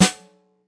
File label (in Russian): sneyr ostryiy hlopok